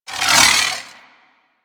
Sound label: Proj Whiz; Warframe; Sentients; Dolicholyst